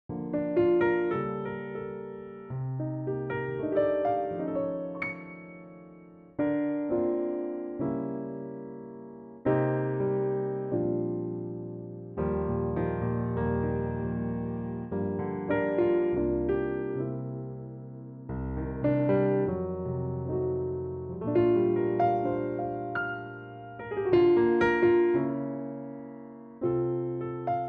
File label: Bb with verse